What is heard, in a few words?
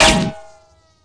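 ricochet metal, weapons; cstrike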